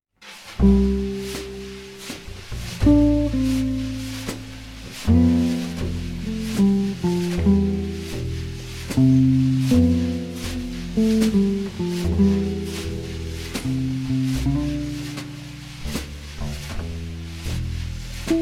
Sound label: drums